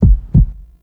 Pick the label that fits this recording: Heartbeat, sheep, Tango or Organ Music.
Heartbeat